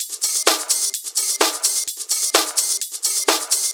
House Loop, 128 BPM; Vengeance Samples

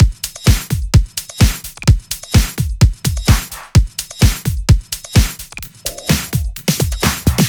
128BPM Dubwise Kit

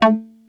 Arp B A3, ARP Odyssey; synth; musicradar-synth-samples

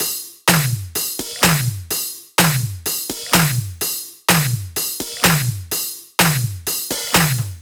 126BPM Cubic Kit; Vengeance Samples

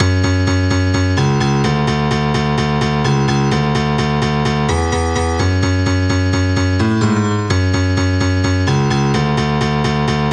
Piano, 128 BPM; Vengeance Samples; VTDS2 Song Kits

